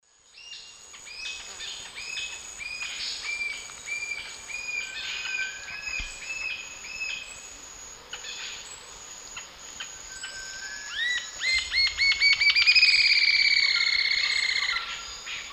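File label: Galbula tombacea, calls and song playback; S. America; Eastern Lowlands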